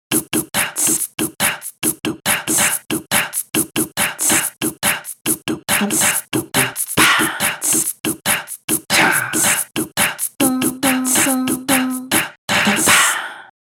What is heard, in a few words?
Drums